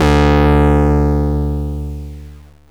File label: purge build up; gae wild jam